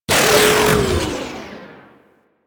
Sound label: Proto Infested Mutalist Death, Vocals; Warframe